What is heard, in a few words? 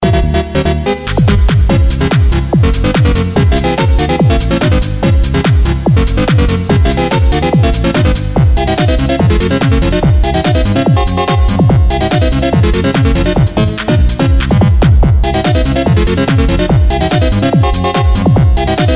Techno; Mario